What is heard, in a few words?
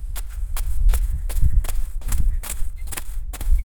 child running on the gras